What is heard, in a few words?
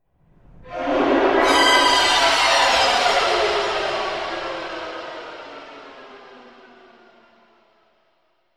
stab